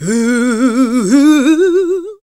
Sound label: GOSP. Singin